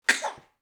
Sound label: baby sneezing